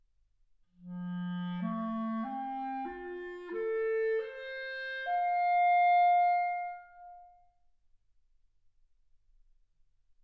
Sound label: clarinet